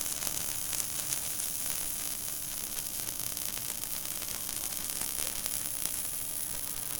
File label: laser noise